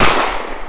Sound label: soft hitwhistle; osu!